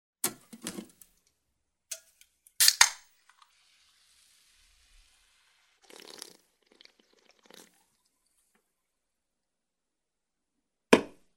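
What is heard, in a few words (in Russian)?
takes from the fridge with ice, opens a PET and puts it down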